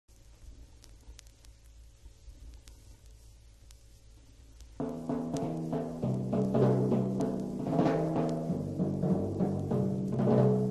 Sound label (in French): Timbales differents effets Percussions